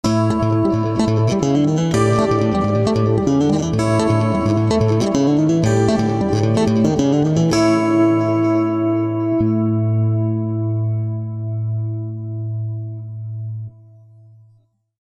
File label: guitar jam; basslab